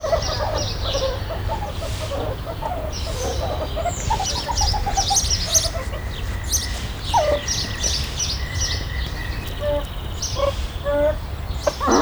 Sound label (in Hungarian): szurikáta; állathangok; nyíregyházi zoo 2010